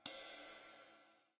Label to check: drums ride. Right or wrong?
Right